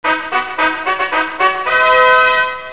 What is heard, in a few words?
program error; Accolade